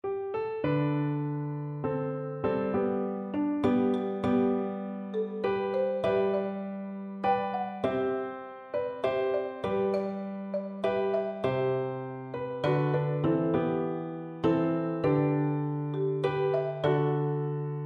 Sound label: MBA; percussion